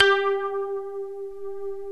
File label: BOTL MARIMBA; OMI - Universe of Sounds